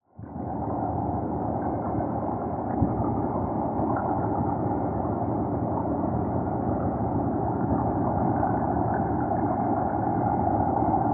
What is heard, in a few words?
Dive Deep Near Machine, Underwater